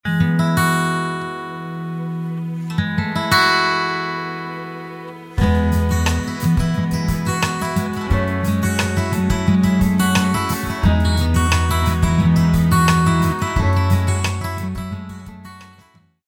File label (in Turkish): santral fon muzikleri